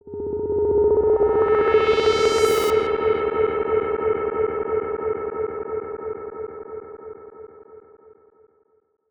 Delayed Synth FX

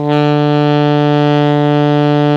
ALTO PP D 2, Sax; 4-WAY

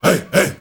HEY HEY; Heart of Africa; Spectrasonics; Heart of Africa 1; CH. SHOUT2

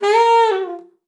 sax a#4, Saxophone slides; Session Instruments

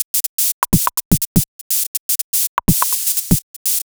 Top 123bpm, House Drumloops; Zero-G House Fabrik Drumloops

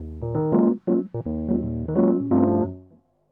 Keyboard; Expansions; Zero-G Smoke

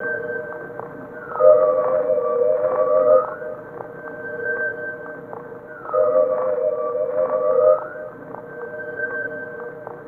Creepy Vibe G#3; Zero-G - Ghost In The Machine

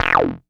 lead C3, Korg MS-20; Polyend; Simulacrum Sounds; 1 Shots; Synths; Leads and Keys

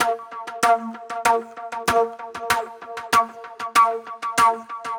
NY 70's Delay Flute

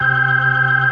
B3 C 3-3, ORGAN; AKAI S-Series CD-ROM Sound Library VOL-1

